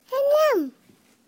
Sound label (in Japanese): nyannyan